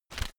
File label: whoosh shredded paper soft; swooshes-swishes